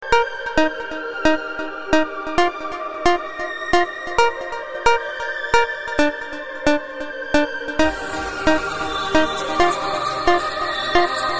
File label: 3d Sound